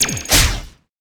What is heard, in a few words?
Armor Break; Inotia 3; Babarian Armor Break